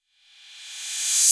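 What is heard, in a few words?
808 cymbal reversed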